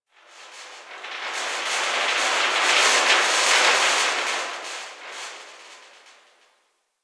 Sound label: Gas City Rattle Distant Metal; Ambience; Warframe; Corpus Gas City